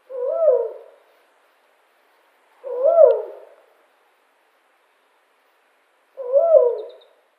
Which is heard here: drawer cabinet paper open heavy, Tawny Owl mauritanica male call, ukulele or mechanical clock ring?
Tawny Owl mauritanica male call